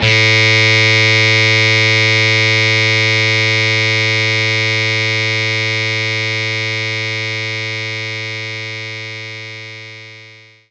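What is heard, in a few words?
Distortion Guitar A2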